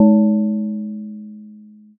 gong

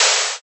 whoosh fall

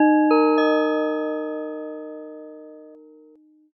gamelan

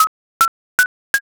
nail

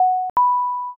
intercom

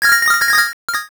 coin shower

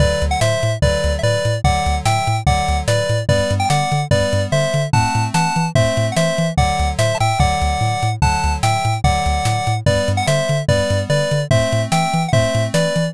bgm street

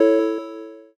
inner voice

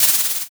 eraser

